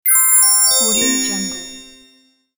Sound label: icy bell trills down